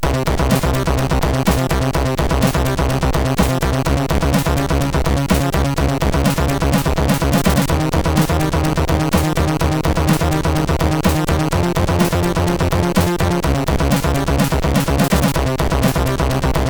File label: Techno Vision, MOS6581; MOS6581R3